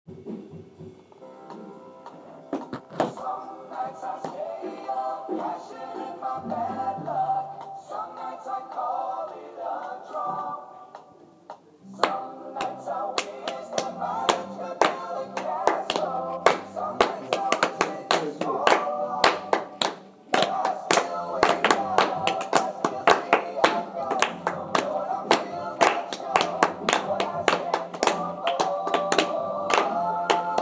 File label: clapping